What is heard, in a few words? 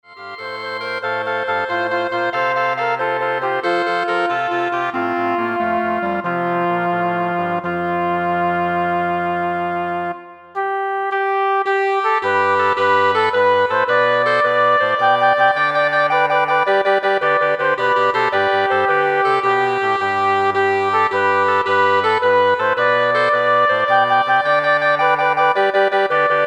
Woodwind Ensemble